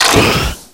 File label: reload